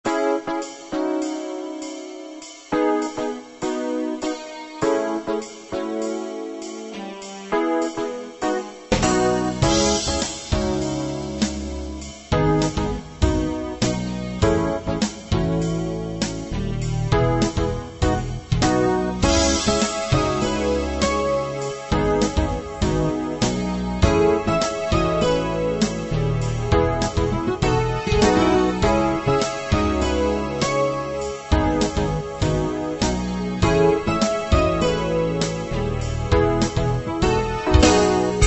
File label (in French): debut techno